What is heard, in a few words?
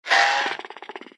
Whelpling Rawr